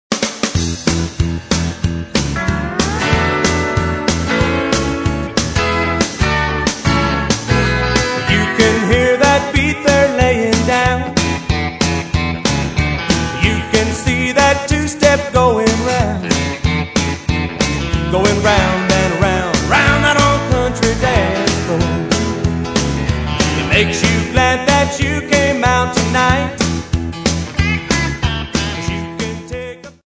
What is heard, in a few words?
Country Dance Floor